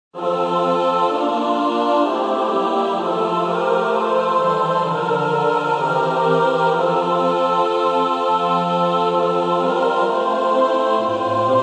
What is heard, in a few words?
Choral; pasion segun san mateo 37 coral; johan sebastian bach